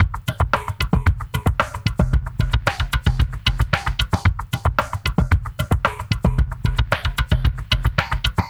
Liquid Grooves; Spectrasonics; 113 LIQUITEK

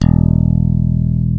FACTOR BASS E; Greytsounds

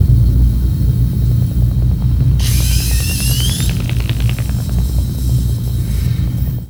Kaos FX, Ambient Grooves

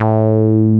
wow bass; Masterbits; Dance Trax

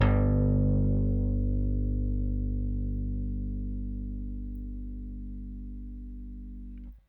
RickThumb-C, RickenbackerBass

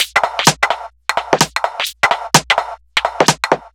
Beat, 128bpm, uk garage

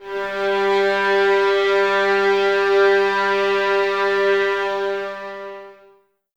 VS G 3, violins, string ensembles; Miroslav Vitous; 11 Violins

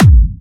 Clubby Kicks, Bassdrums

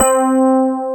ELECTRIC PF, Piano; AKAI S6000 CD-ROM - Volume 1

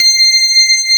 CLAV, FUNK FM CLAV

